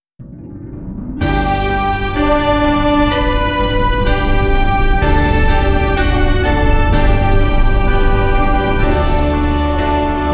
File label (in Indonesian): HYMNE PRAMUKA INSTML